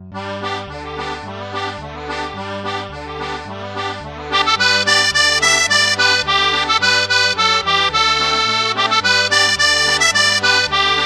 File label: Melodica Pianica; Akkordica; Syntheway